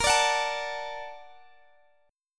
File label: Bb Augmented Major 7th, Guitar, Open D; x,x,20,20,21,19